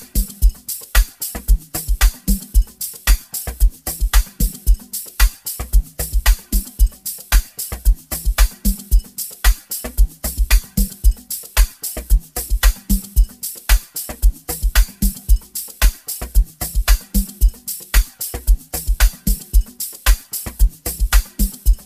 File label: Amapiano Mix